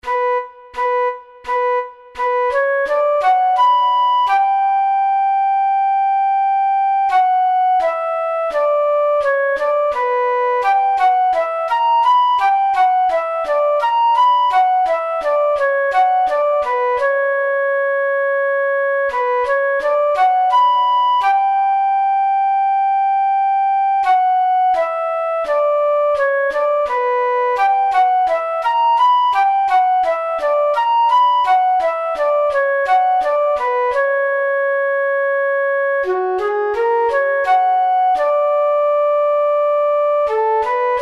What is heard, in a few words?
Flute; Bach Johann Sebastian; Suite H-Moll Nr2 BWV1067